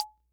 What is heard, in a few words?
Perc, sr-95; classic machines